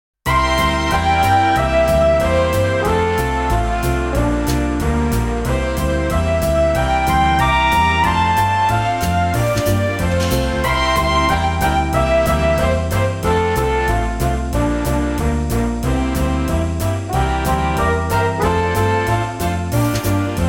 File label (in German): kaskaden big band ballad